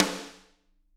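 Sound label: snare drum; Bob Clearmountain Drums I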